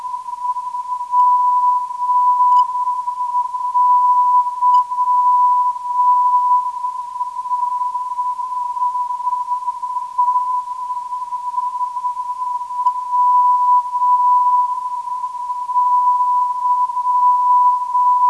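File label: 237kHz, non-directional beacon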